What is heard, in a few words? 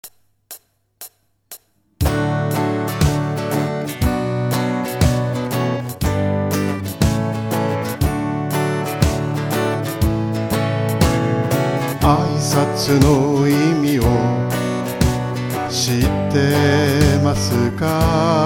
greeting song